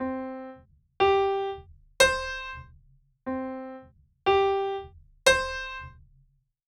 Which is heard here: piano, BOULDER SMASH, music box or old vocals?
piano